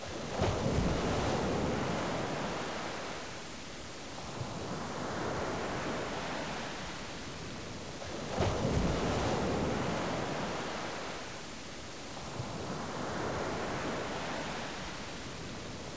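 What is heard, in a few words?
wave loop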